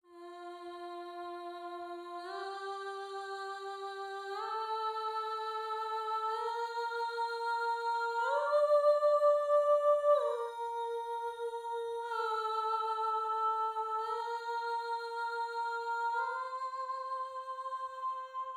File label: Alto, counterpoint